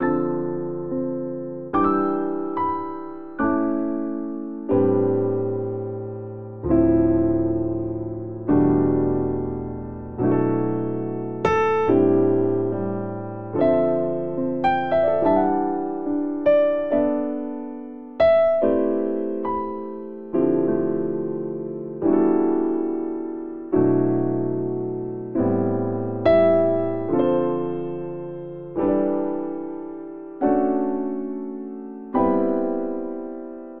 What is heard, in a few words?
Progression Chill Soul Jazz Dmin - Emin - Bmin - Amin 71 bpm, Grand Piano; MIDI Clips; Ableton; Factory Packs